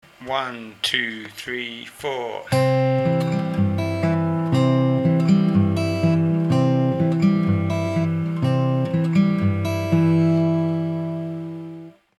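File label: travis C, guitar arpeggios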